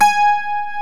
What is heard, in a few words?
12 STRING; OMI - Universe of Sounds